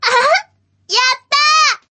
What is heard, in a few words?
win; fight Result